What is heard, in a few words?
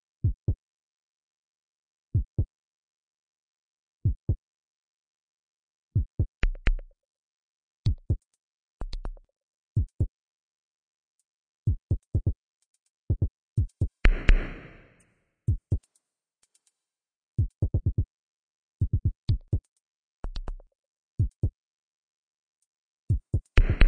heartbeat